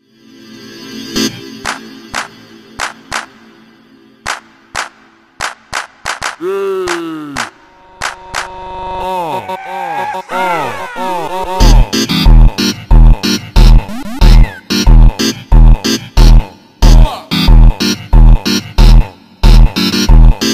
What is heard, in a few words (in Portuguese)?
montagem sonic ritmado super slowed